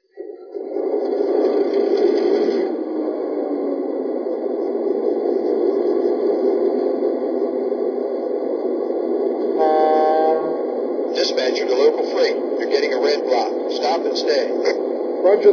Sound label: trnmstr 1ch